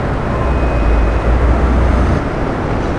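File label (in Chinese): 城市喧闹; 红色警戒2; 环境音效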